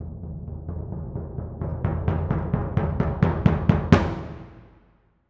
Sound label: Bass Drum, Orchestral Series Rock and Pop; Sonic Foundry (Sony Creative Software); Orchestral Series VOL-4 - Rock and Pop